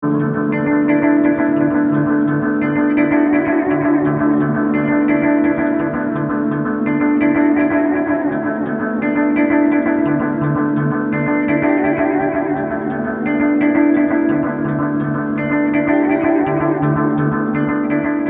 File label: melody, ghost delay